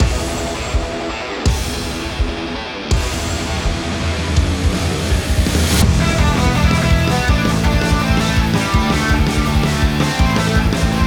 Electric guitar music for Boss Battle theme. This music is loopable.